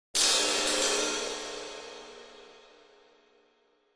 Cymbal Shrt Cacophon

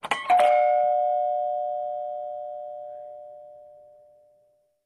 Door Bells Multiple B

